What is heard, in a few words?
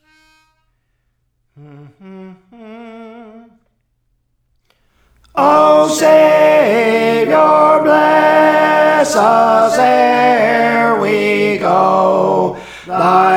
Tenor, Songs; O Savior Bless Us Ere We Go; Praise For The Lord Training